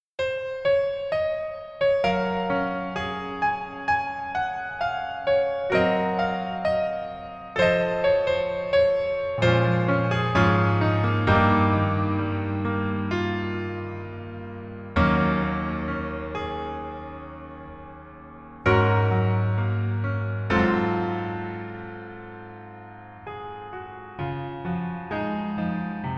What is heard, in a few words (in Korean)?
Piano ver 멜로디MR